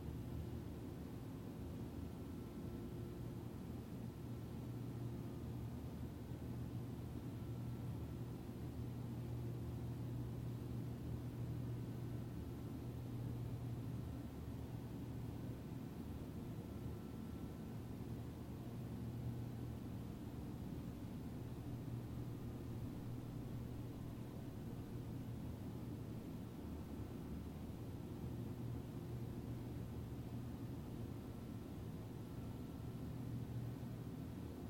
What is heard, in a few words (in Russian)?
gulkij garmonicheskij ladnij zvuk raboti proizvodstva